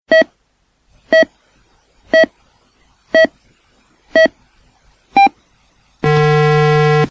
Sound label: start vals